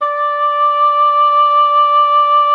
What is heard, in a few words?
OBOE D5; Xsample VOL-6